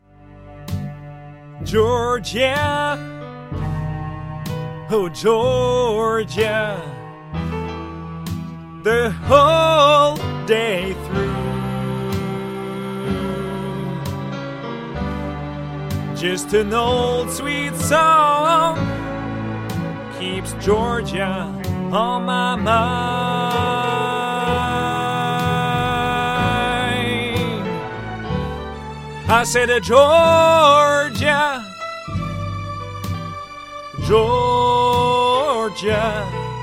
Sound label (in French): chant, Chanteur